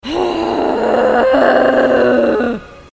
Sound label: roar